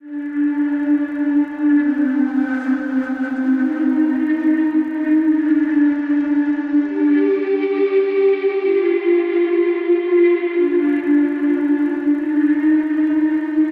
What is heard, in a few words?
Lonesome Pad - Gmin 140 bpm; MIDI Clips; Tonal; Atmospheric; Ableton; Factory Packs; Mood Reel